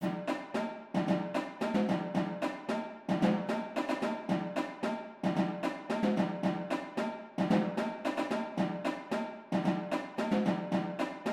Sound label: tenors, drumline